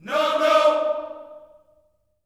NO NO, Vocal Planet Gospel

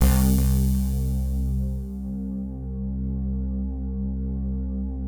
digi pad, Pads; Best Service; XXL 800 Pads; Sample CDs

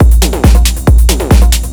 drm 138, Techno, drum-loops

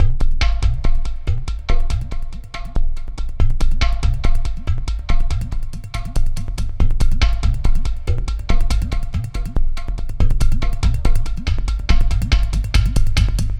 Terrestrial Hybrid, Processed Percussion; Sonic Foundry (Sony Creative Software); Rhythmicronics

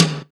TOM, Eurotech; Sounds Good

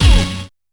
D5 Uh! Hit, Yamaha PSR-E323 Sound Effect Kit

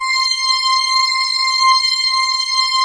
FLANGE C7; Greytsounds - Scott Peers Sound Engineering

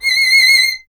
STR VLN, Vln Detache; Miroslav Vitous

